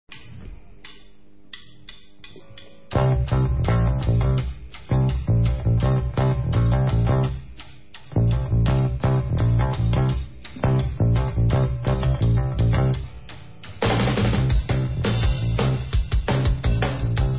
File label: positive tensions